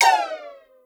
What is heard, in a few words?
Invalid Power Up Sound Effect; triangle adventure v1.1 unofficial soundtrack